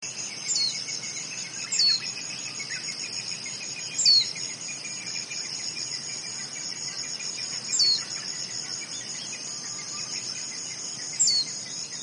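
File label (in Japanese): mejiro, Japanese white-eye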